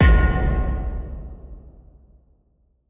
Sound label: Epic Metal Hit; soundscrate